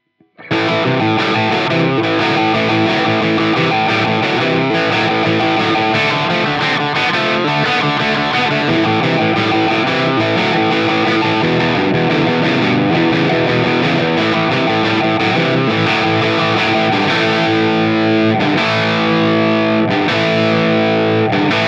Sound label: Lead